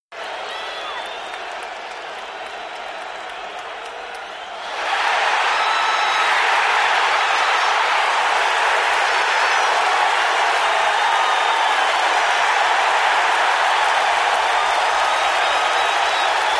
Football Crowd Huge

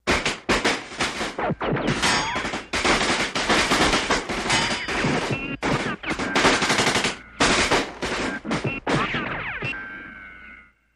Gun Fight Vari Shots, modern

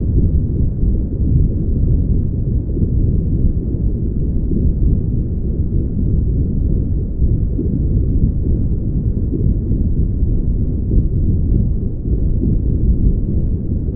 Propeller Movement Underwater

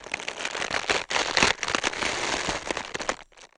Cabbage Rub Crackle; gore stabs & eat

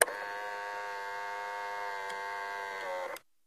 Thread Mechanism, dat machine

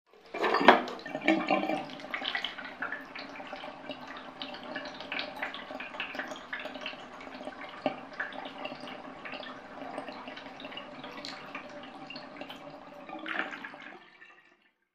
Bathtub Drain Water

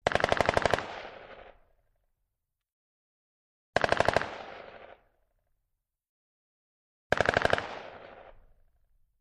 M16 Rapid Fire Distan

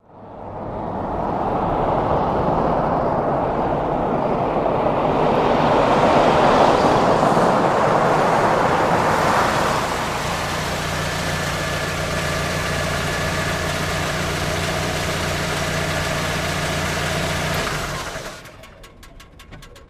Jeep Cherokee 84 In Stop

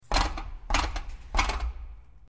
Light Hits On Metal Do, knocking

